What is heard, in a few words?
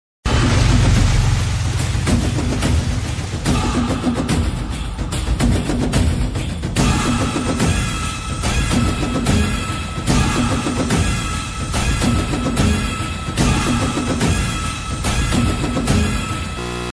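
mass background music ringtone; pushpa; allu arjun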